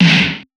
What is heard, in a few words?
Snares, Alesis Performance Pad